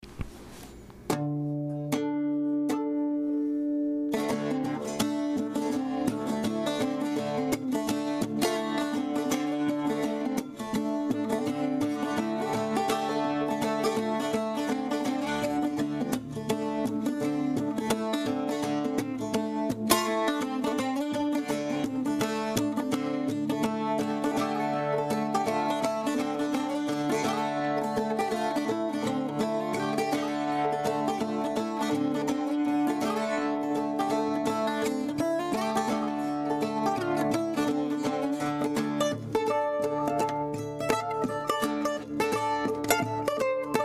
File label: Bouzouki